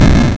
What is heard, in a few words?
Explosion; Final Platformer